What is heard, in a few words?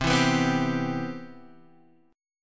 C Major 7th, Guitar Half Step Down; CM7